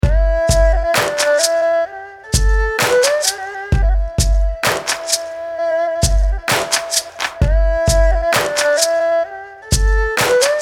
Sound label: Oriental Funk